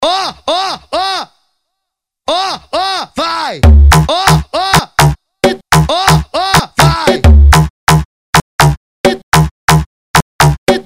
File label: Beat